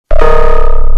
mail notification sound; outlook

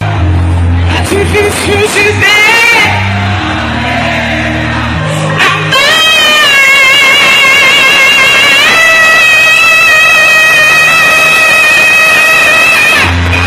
high note, grito

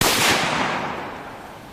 rifle

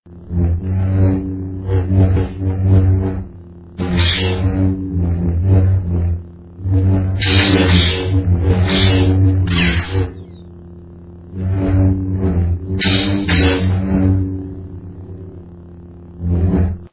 light sabers fight

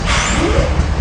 Amtrak P42 throttle sound; jaymacnally

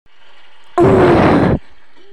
uuuuuuuuuuuuuf

